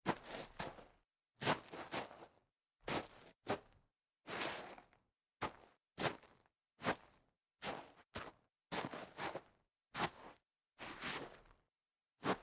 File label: scuffing on dirt